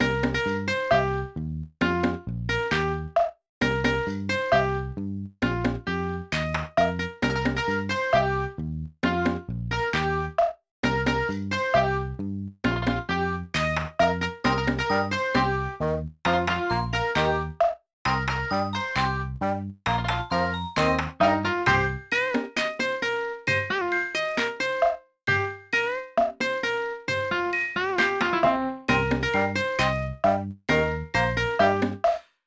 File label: Mus Gameplay; Wonder Pets Save the Animals 2008 NDS gamerip; China